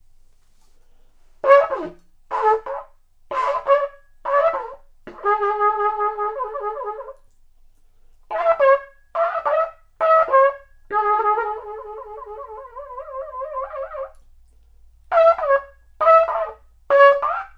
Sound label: bird